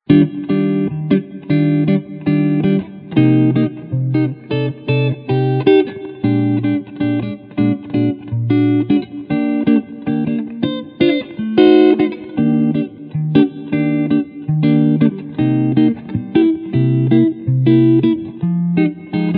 Clean Front Humbucker

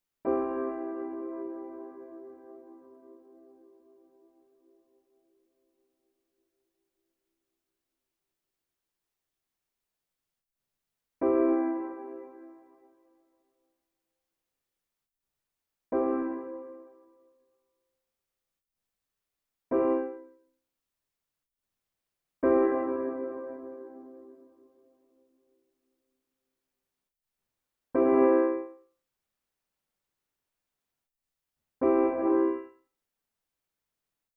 Piano All Reverbs

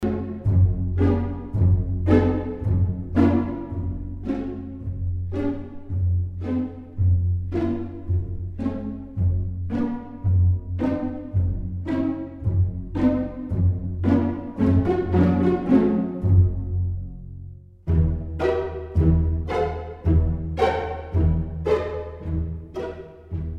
Nucleus String Ensemble Pizzicato

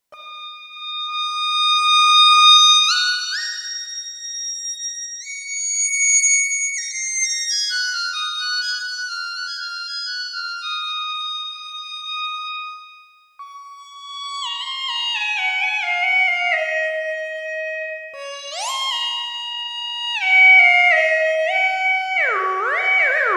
FM Sound

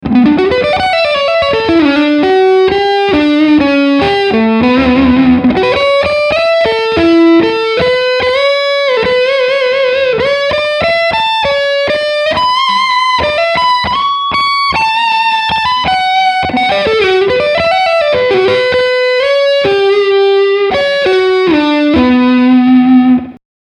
Lick Front HB

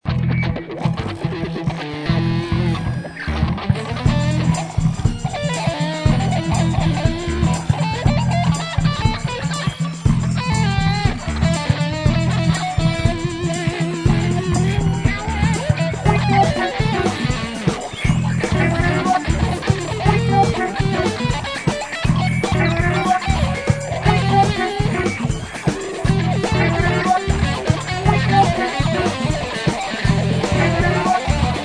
Pick a Knocker SOLO

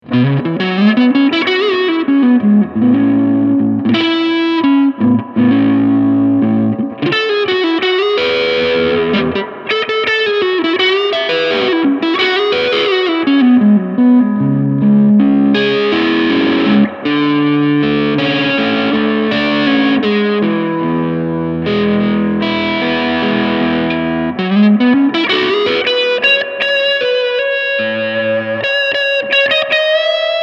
Supro Delta King 12 Combo Drive; Bass 12 Mid 9 High 12

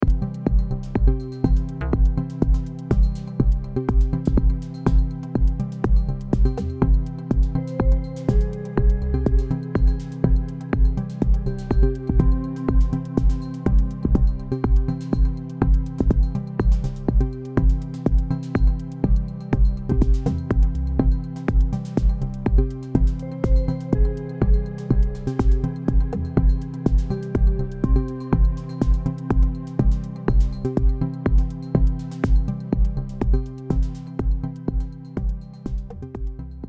Digitone; Presonus64S

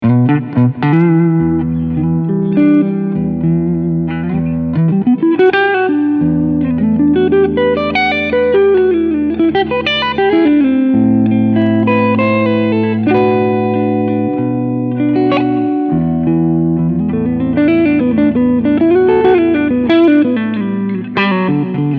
Front HB Clean

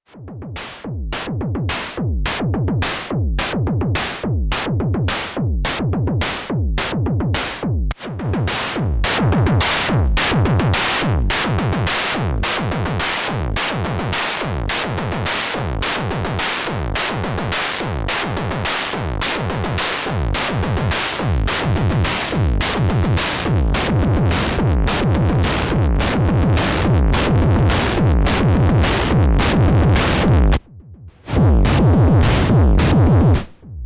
JHS Colour Box V2 Moog DFAM High Headroom

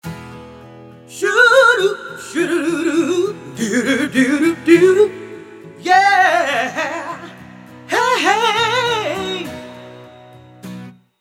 BOSS VE1 ENH Green Echo Medium Double

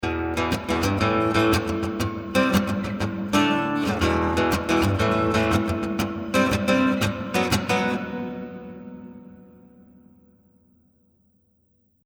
Mono Hall

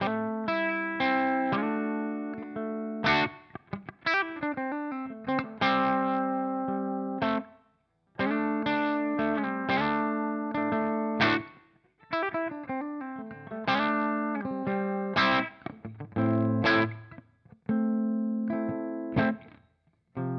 Germanium Fuzz Humbucker Mid Gain; Benson

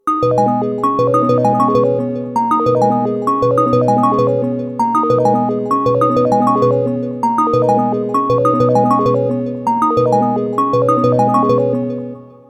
Korg minilogue xd

